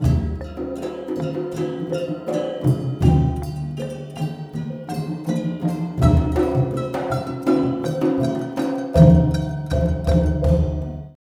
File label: MALAY PHR L, Heart of Asia